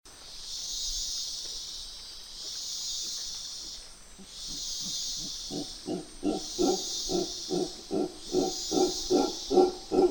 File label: Mantled Howler Panama